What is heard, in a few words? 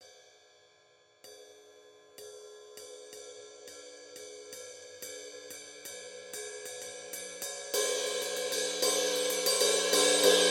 Paiste PST8 Rock Ride